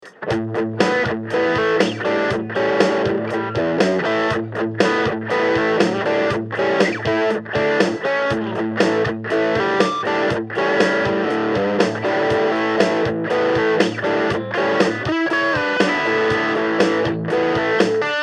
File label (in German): High Gain Modus Drums